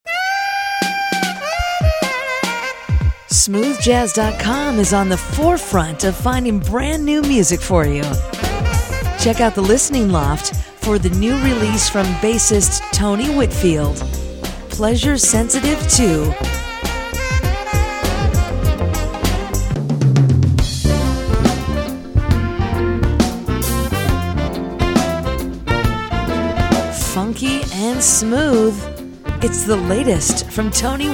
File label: smooth jazz radio spot